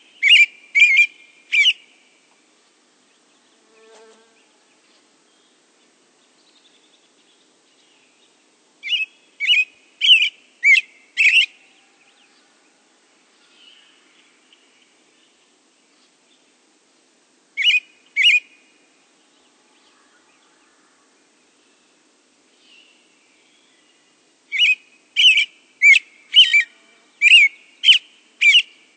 American Robin; Birds

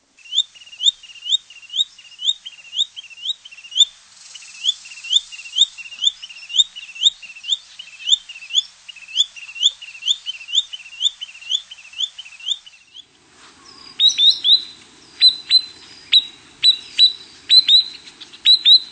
Spotted Sandpiper; Birds

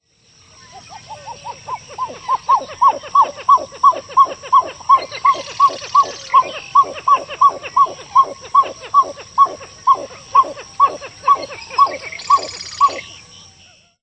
Pied-billed Grebe; Birds

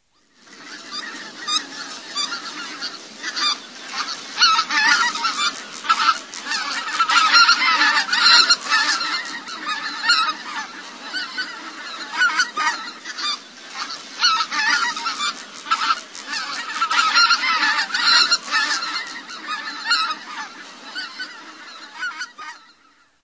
Greater White-fronted Goose, Birds

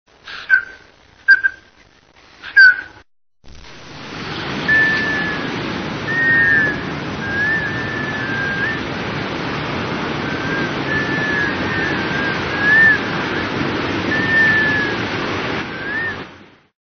Black Scoter; Birds

